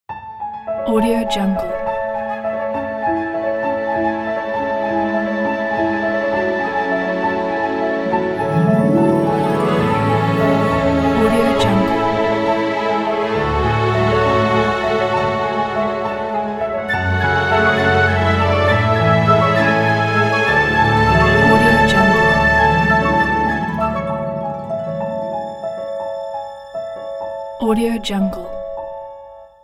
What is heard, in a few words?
fairytale love